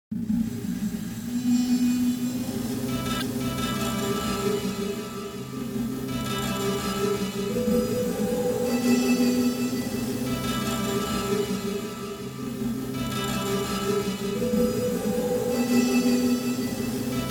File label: Chords